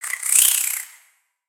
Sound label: Spider Idle Bored; Lego; creatures mechanical